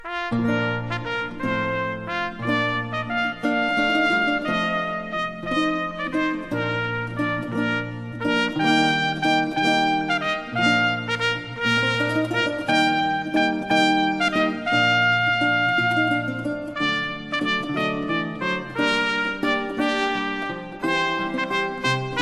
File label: fanfare